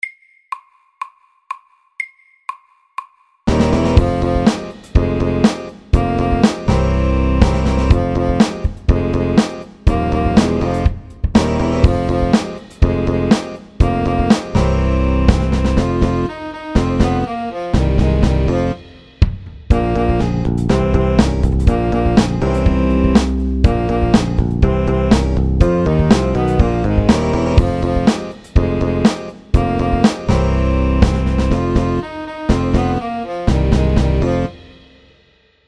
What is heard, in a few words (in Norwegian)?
Funky FORT; Funky monkey